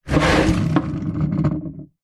household plastic esky slide on slightly gritty concrete patio water movement; inside